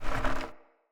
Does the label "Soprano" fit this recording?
No